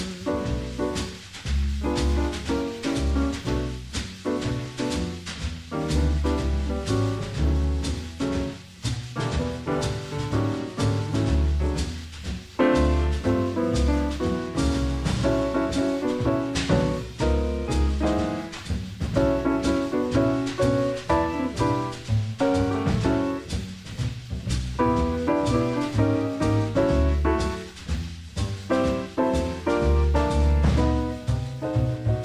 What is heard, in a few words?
CHORUS; Standard Jazz